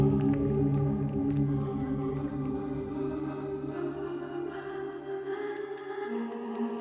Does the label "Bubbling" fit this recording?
Yes